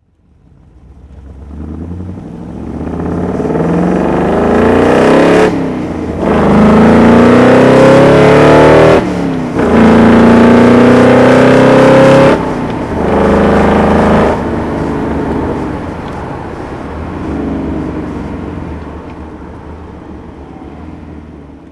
AC Cobra 427 driving, Engine sound driving; Shelby AC Cobra 427 recreation, 1961; V3D/ ClassicarImages; Marc Vorgers

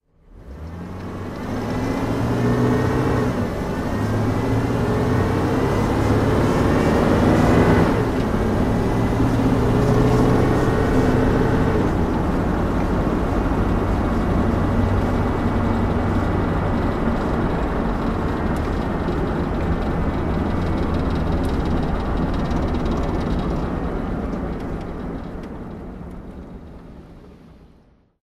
oldsmobile eight driving, Engine sound driving; Oldsmobile 98 De Luxe, 1941; V3D/ ClassicarImages; Marc Vorgers

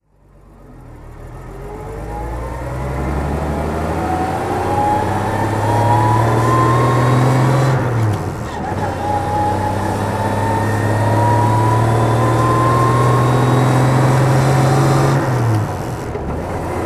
delahaye 135M driving

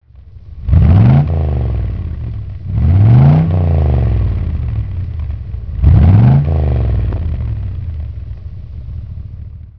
Facel Excel, classic car

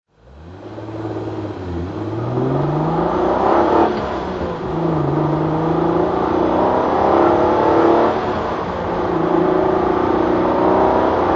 alfa romeo sz driving

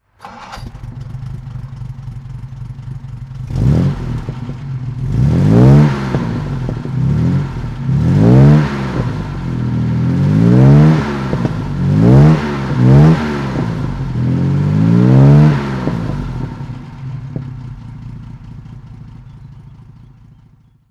jaguar xk150 38S dhc throttle; Jaguar XK 150 3.8 Litre -S- DHC, 1960; Engine sound; V3D/ ClassicarImages; Marc Vorgers

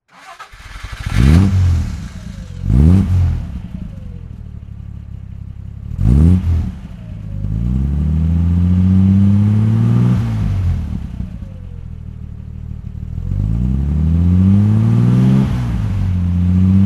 alvis TE21 DHC throttle

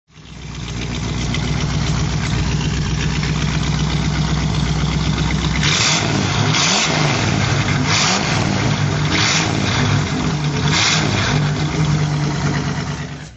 chevrolet impala

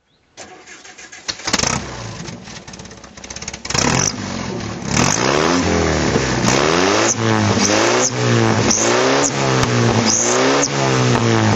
healey 100 4 throttle

